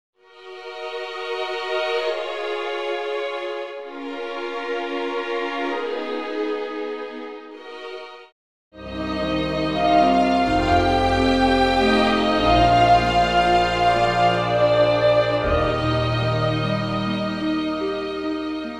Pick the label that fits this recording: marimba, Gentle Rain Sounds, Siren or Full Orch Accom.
Full Orch Accom